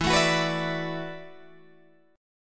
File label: E 7th Suspended 2nd Suspended 4th, down, Guitar, Open G